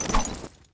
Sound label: knife slash, weapons; svencoop; ww2projekt